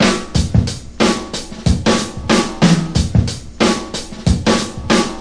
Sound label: classic break, drum loops and breaks